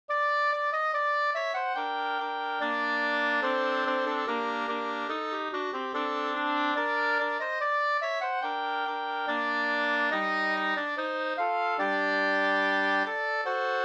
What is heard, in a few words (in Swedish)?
ganglat; Kyrkmarsch